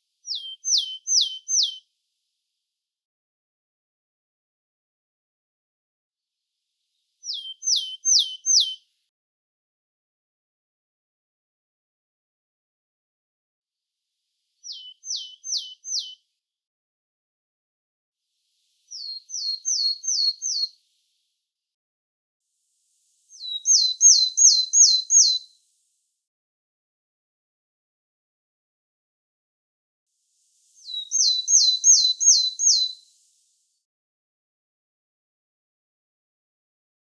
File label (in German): Weidenmeisen Gesang